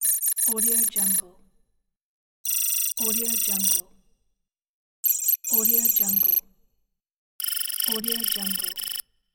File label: digital text